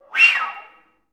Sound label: FL FX, Advanced Orchestra